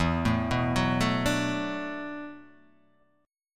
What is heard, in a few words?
E 9th Suspended 4th E down